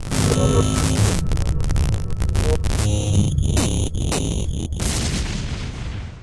portal travel; minecraft; PixelPerfectionCE

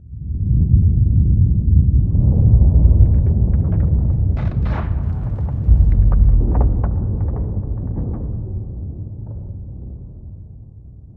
cave, ambient; minecraft; PixelPerfectionCE

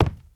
wood, step; minecraft; PixelPerfectionCE

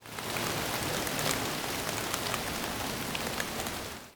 rain, ambient weather; minecraft; PixelPerfectionCE

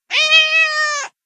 cat meow; mob; minecraft; PixelPerfectionCE

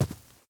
grass, step; minecraft; PixelPerfectionCE

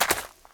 gravel, step; minecraft; PixelPerfectionCE

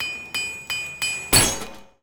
anvil break; minecraft; PixelPerfectionCE

